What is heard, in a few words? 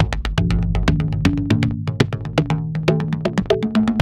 TALK, TALKDRUMSYNT, Yamaha VP-1; Music Store